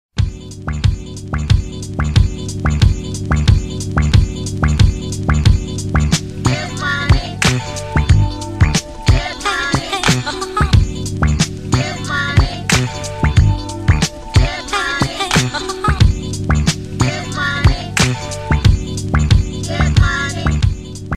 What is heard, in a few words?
cool English tone ringtone